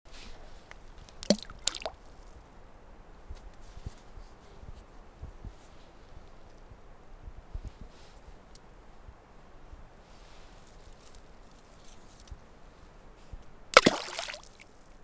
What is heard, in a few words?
Rocks In Lake